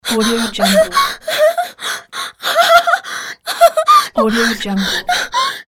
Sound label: scared female